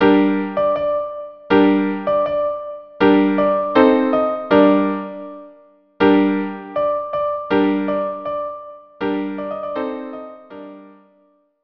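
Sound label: les dragons carmen, violin duo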